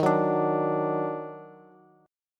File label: Eb Minor Major 7th, down, Bass; strummed; chord; JGuitar